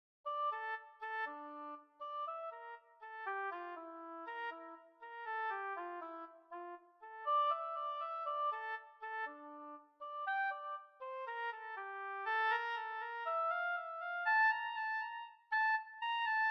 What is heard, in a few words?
Didgerioboe